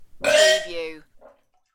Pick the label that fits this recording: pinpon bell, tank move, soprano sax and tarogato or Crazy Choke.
Crazy Choke